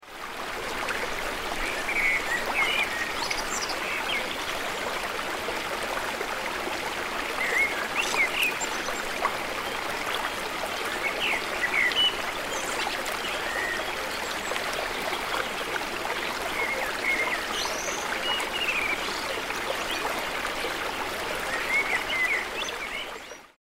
Forest ambience nearby fast river with birds in the trees